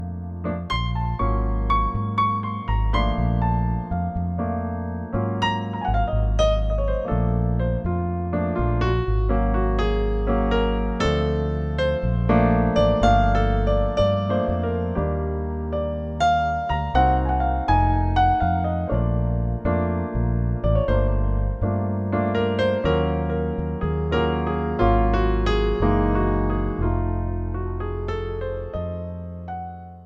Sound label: jazz band